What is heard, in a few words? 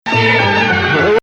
Nawa Athar Gameel Rast; Maqam Nawa Athar